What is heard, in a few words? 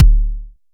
analog attacked, kick; Ableton Foundation FreePacks